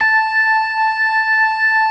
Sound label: LOW MAN, LOWER MANUAL, Organ